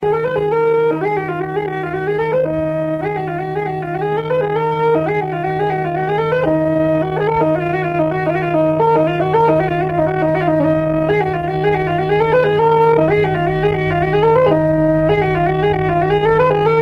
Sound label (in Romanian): Caluselul la cimpoi; jocuri si cantece batranesti; Vaslui